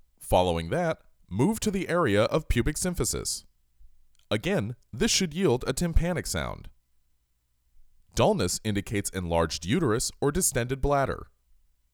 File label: Percussion; Ab Percussion